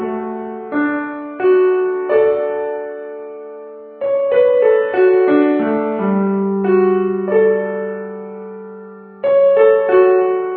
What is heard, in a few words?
Concert Piano Room